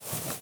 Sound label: crouch Off; Apotheorasis